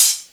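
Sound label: Closed Hi-Hat, Dance Drums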